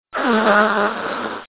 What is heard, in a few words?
Nose Blowing